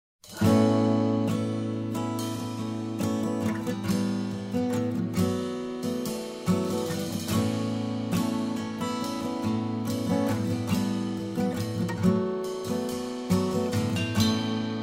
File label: Power Ballad; Soundzabound Volume 5